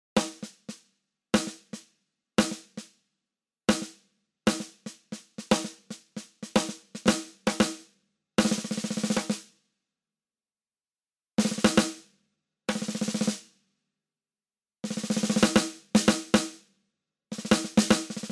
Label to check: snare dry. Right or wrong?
Right